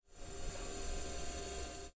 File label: Start Electrocar